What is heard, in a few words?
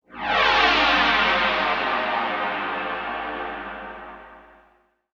SPACE FX 140; SpaceSounds